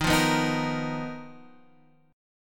B Major 7th Flat 5th D sharp

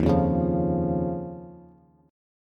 Eb 7th Suspended 2nd Eb, Bass; 11,8,11,10; Eb7sus2 strummed; JGuitar

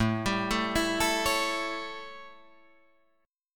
A 7th A, Guitar Drop D

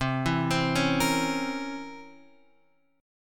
C 7th Flat 9th C Guitar Open F; C7b9 arpeggiated; JGuitar

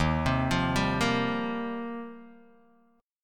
D sharp 6th Add 9th, Guitar, DADGAD

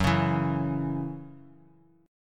C sharp Minor Double Flat 5th F sharp, chord; C#mbb5/F# strummed

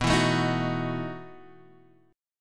E Major 7th Suspended 2nd Suspended 4th

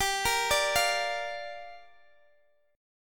G Minor 7th, Guitar, DADGAD

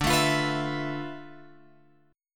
D Minor 6th Add 9th D; Dm6add9 Chord {10 12 X 10 12 12} strummed

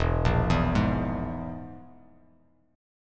E Major Flat 5th E, Bass Standard; arpeggiated

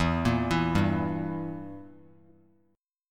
E Diminished down; Edim arpeggiated; JGuitar

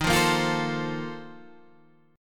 Bb Major 7th Suspended 2nd Suspended 4th

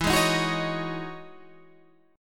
E Major 13th chord

